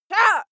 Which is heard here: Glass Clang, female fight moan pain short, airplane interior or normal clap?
female fight moan pain short